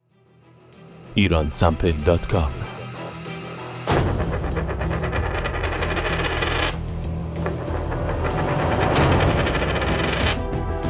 Coin, money

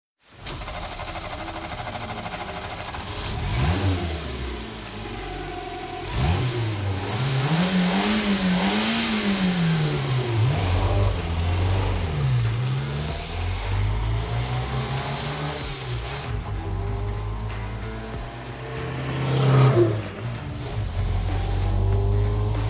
Renault r4, sound effects

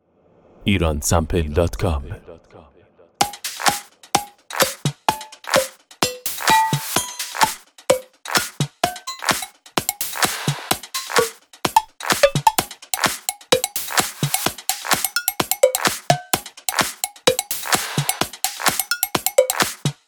Cowbell

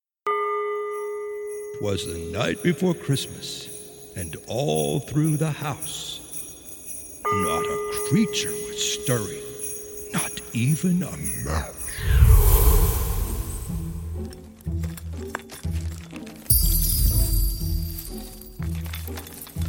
Christmas, holiday sound effects; epic stock media

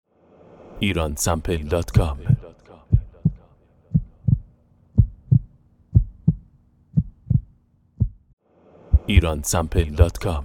heartbeat; Iransample

